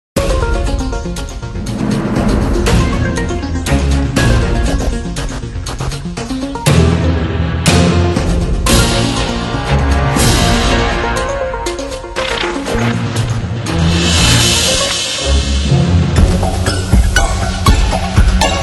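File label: evil thunderstorm music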